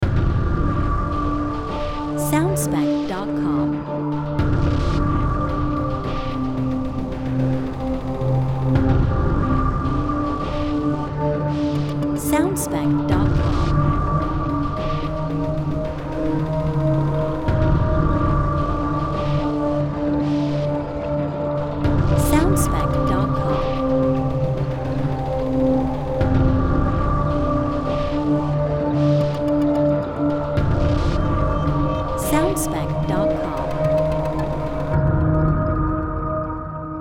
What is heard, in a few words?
Dark Pulse Bed Variation B